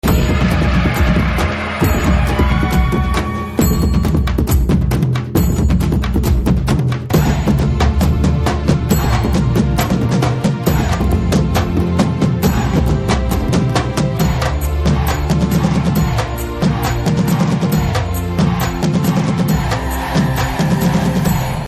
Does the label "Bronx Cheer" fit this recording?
No